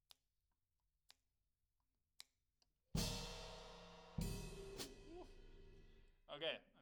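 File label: SNR Top, snare top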